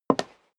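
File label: step high heels wood creak single left, footsteps; schritte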